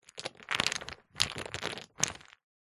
leisure game wood blocks movement on carpet; jenga

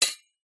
musical instrument ching gamelan single hit

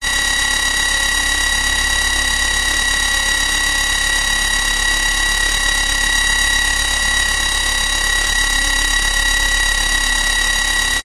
science fiction machine whirr aggressive harsh; zapsplat

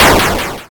leisure video game retro 8bit explosion

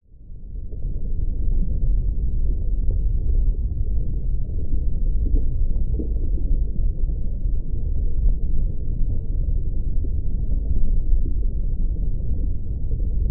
nature underwater ambience deep

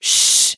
human voice shh female; sound effects one

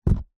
household toilet roll down on surface; zapsplat

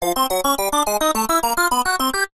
game sound retro climb ascend

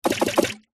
game designed water hit thump

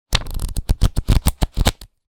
money notes bills wad flick through; zapsplat

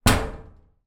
impact metal panel door resonate

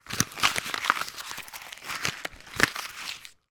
christmas cracker opened feel inside; foley; zapsplat

